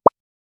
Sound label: gentle blip sound indicat